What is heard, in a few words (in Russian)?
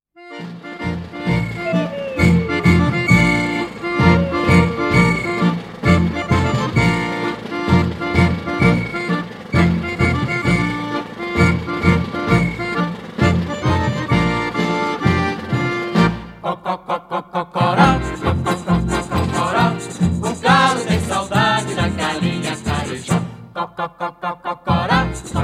kvintet, poppuri na temyi brazilskih karnavalnyih pesen